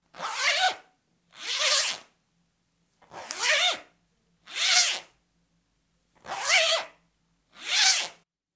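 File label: zipper fastener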